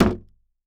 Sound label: Foley Table Fist Hits Hollow Wood Table